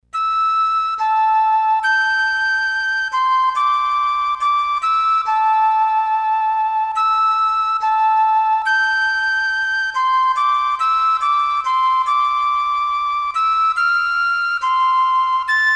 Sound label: flute solo